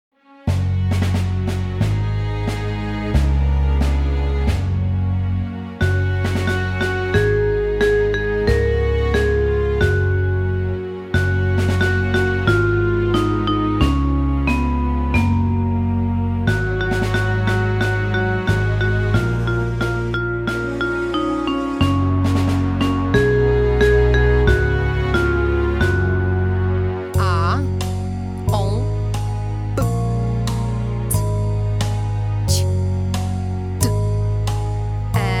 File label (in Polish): wersja instrumentalna